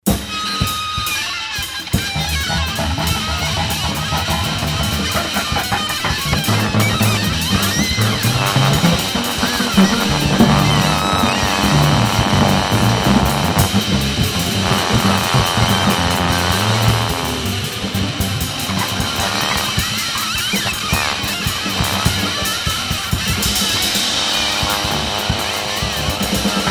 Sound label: noise